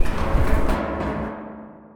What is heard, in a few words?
vent retreat sound